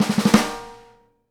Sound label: Live Snares; E-MU Producer Series Vol. 8 – Platinum Phatt